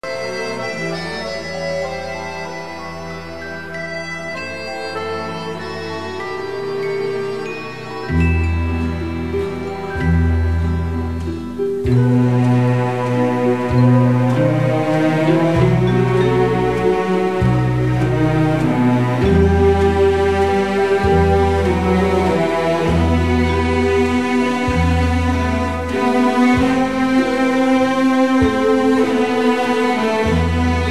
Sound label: Strings Orchestra